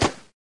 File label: mp5 shot